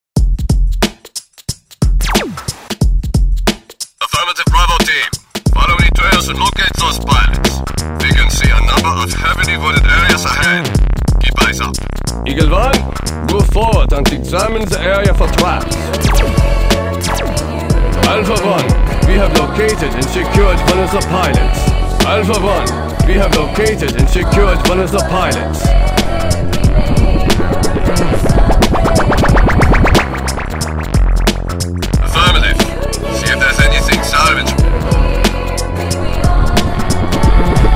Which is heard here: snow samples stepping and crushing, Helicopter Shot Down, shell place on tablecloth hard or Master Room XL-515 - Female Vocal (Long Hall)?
Helicopter Shot Down